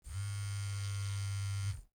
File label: Cell phone vibrate